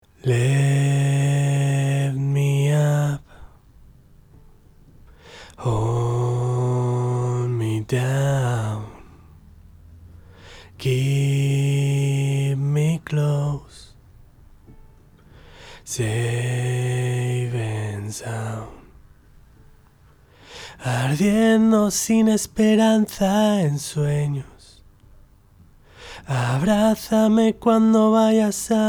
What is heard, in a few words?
Lead Vocal Before Male